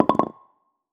ambience bet Sign; netent

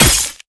Hit Light Ice Add; netent

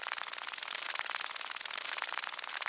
fs gobb fly; netent

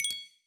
Coin Count Loop End; netent

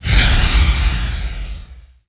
swipe Bonus Game; netent

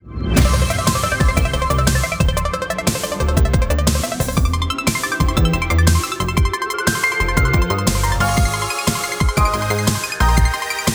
bigwin; netent

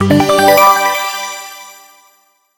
scatter Win; netent

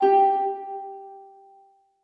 melody G4; netent

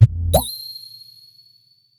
App Bell; netent

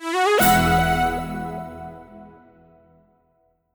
Win General; netent

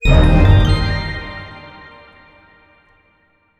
medium Win Tonal Eb; netent

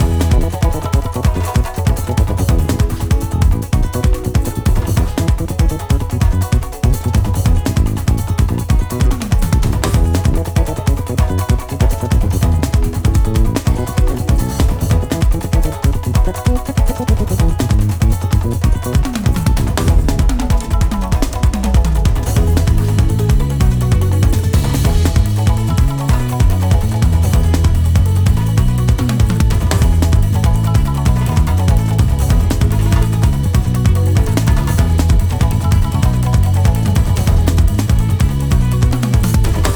music free spin

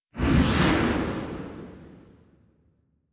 big win start; netent

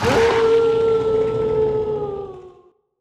scatter Landed; netent

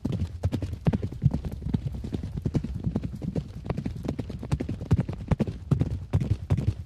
racing horse loop; netent

